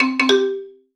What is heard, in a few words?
Solo Xylophone Positive